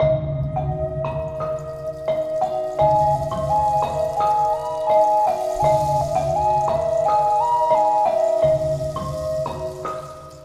village mayan night; curious expedition 2 macos ps4 switch windows xbox one xbox series xs gamerip 2021

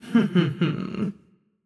Laugh; Dryad Reaction Laugh(04)

